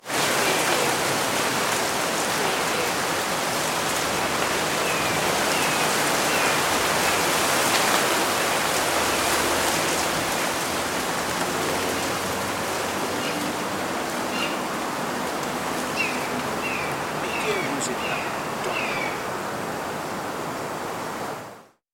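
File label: Wind Palm Trees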